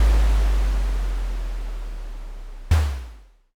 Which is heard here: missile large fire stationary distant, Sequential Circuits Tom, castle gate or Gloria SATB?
castle gate